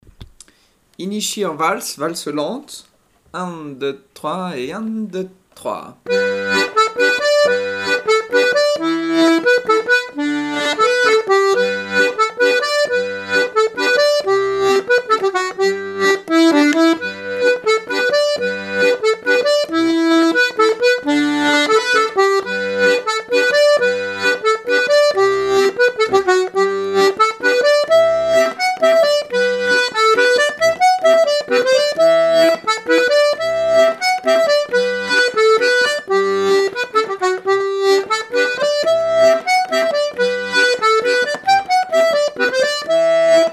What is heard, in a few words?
waltz; ateliers diato 2